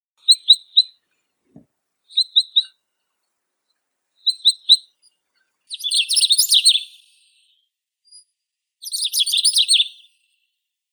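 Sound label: long-tailed rosefinch, Uragus sibiricus; zvuki prirodi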